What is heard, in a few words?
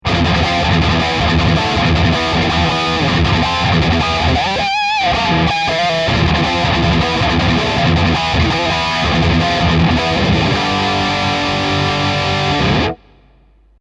Bridge High Gain